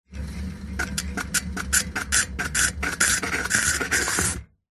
vehicles bicycle pushbike front wheel brake slow rubs then stop close up; zapsplat